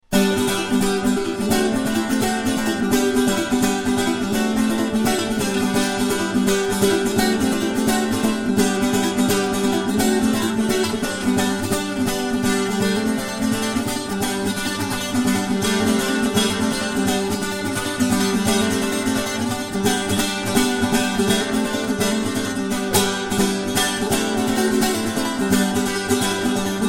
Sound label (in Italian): Pizzica di Ostuni per battente sola